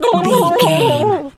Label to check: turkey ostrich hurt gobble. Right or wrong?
Right